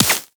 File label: Player Laser Impact Flesh; Warframe